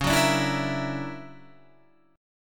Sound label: D Augmented Major 9th C sharp, guitar